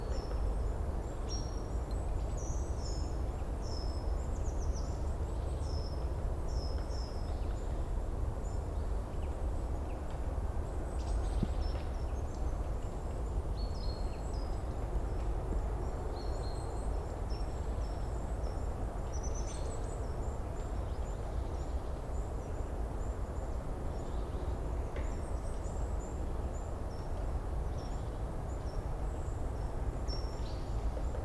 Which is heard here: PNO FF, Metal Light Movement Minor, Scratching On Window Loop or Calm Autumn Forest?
Calm Autumn Forest